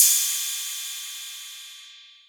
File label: 808 Cymbal; Novation DrumstationV1